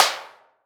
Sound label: Impact Hammer Hit Sheet Metal Single; Impacts